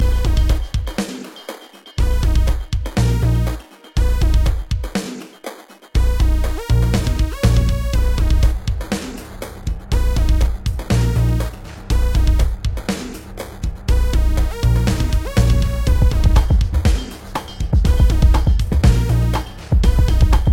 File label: loop